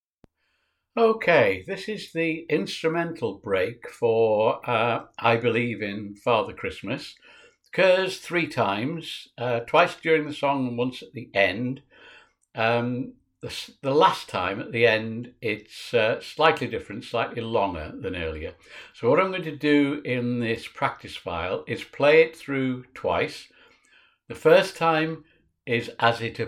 instrumental

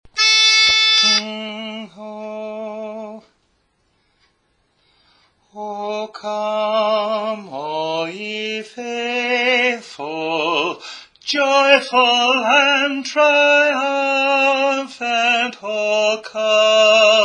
Lead; O Come All Ye Faithful

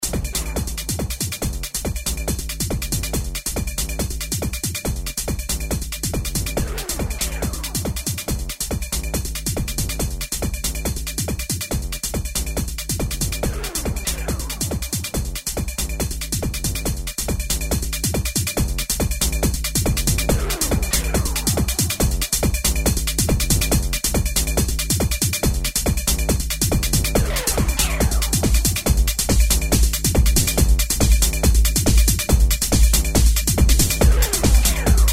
groove

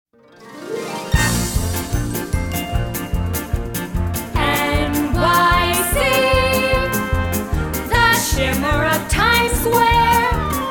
Boys Callback Song; Annie KIDS

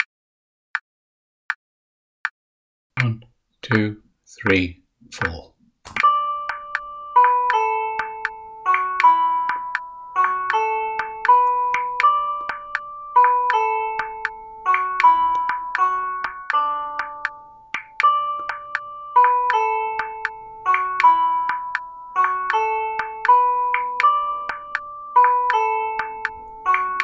Uke 2 Practice File

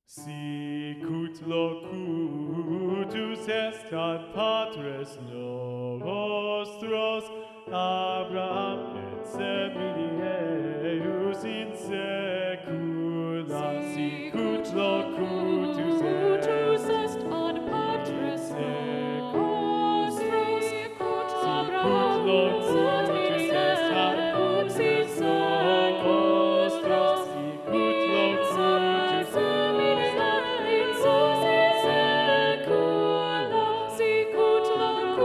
Tenor Muted; SATB; Sicut locutus est

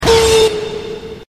Howl

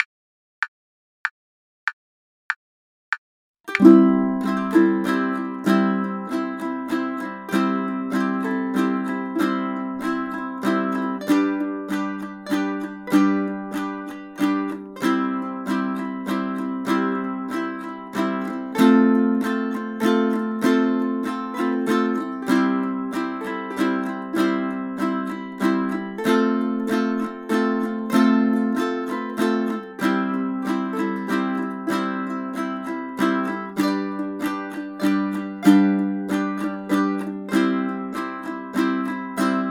Strum; Silent Night

